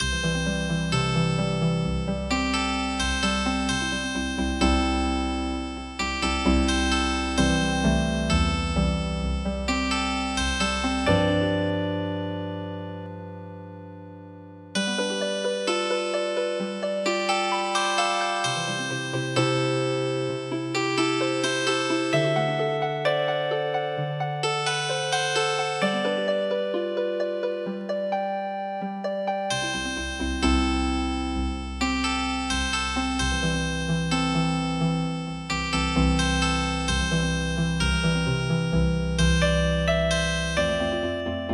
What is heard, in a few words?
Healin Harp